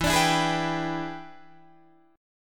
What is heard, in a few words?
E 7th Sharp 9th Guitar Open D, chord